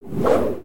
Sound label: Gnarled Torch Spin